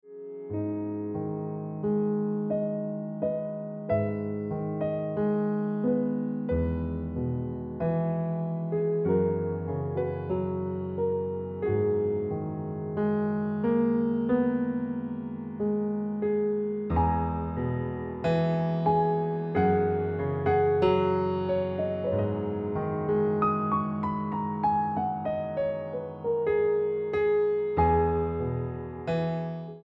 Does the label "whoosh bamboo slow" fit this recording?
No